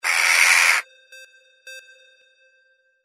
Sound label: CROW